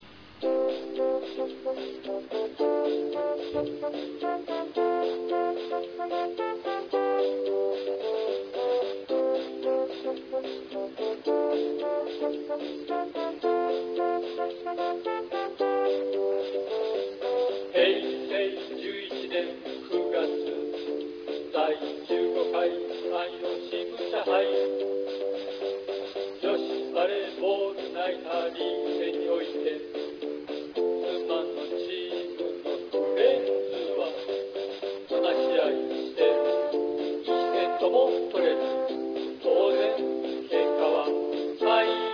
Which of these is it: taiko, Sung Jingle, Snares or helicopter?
taiko